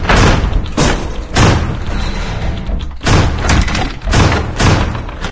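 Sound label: zombie door loop; video games; city; source engine; episodic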